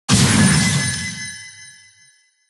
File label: bow shot; Blue slush cookie; cookie run